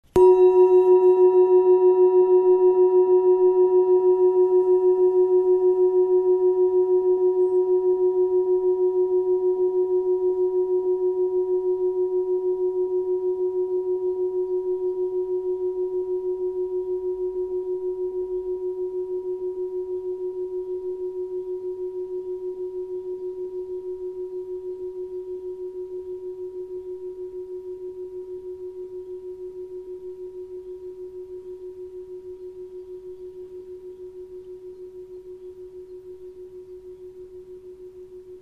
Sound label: planet bowl; planet bowl jupiter